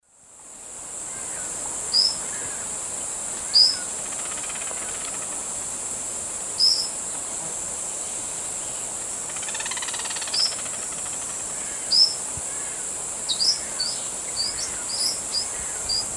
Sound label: Forpus sclateri, calls and flight calls group; S. America; Eastern Lowlands